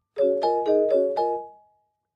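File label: Clavichord Short Funny; keyboards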